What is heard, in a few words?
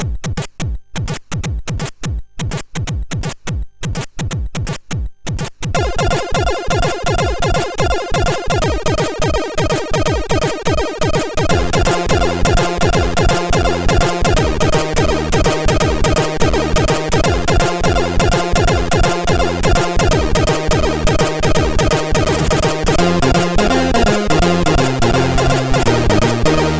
SID Jig, MOS6581R4